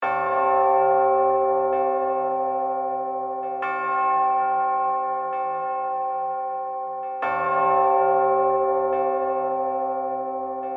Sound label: Bed Bells Ring, Horror, Chime, Drone; Scary Soundscapes